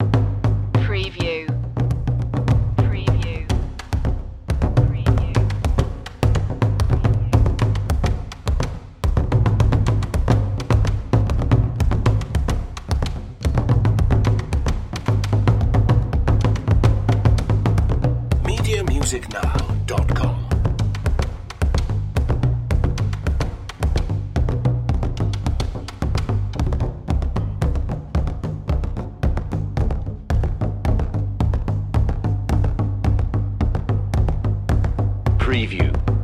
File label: african drums bwea